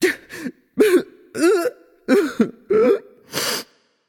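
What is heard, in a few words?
nonverbal boohoo; voicepack; smite; merlin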